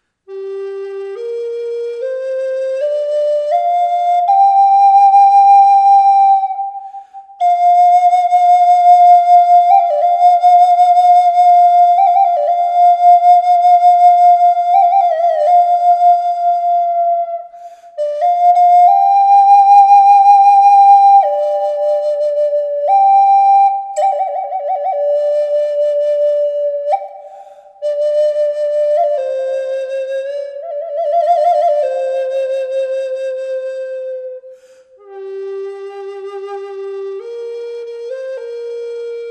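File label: reverb, flute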